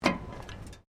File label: FOOTSTEPS SNEAKERS METAL PIER STEP, Walking on a metal pier; Human